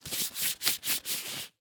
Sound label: Latex Glove; Medical; Blastwave